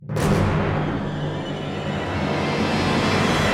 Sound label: Boss Intro; Stage02